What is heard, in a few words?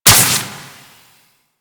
Jetpack Thruster C; Warframe; Corpus Jetpack